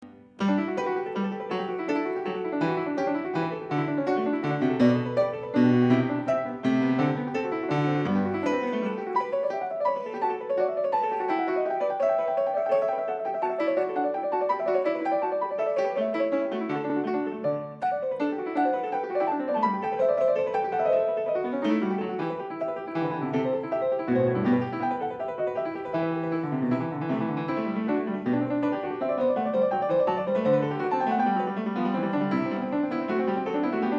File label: Bach Goldberg Variations a 1 ovvero 2 Clav, PIANISTS